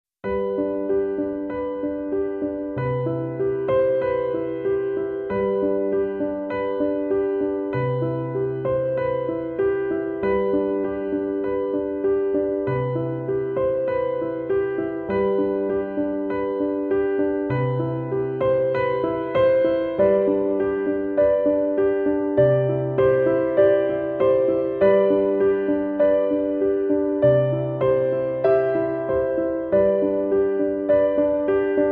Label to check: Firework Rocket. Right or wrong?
Wrong